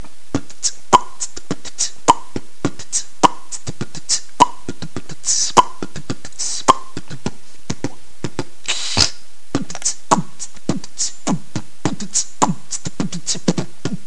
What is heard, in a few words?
hihat ritmo